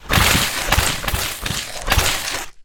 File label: Gut Rip L To R